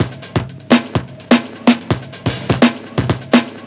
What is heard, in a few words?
Funk Break, Drum Loops